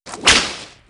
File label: whip; soundboard